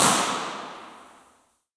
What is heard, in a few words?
Waterfront Park, Impulse Response; Seward; EchoThief Impulse Response Library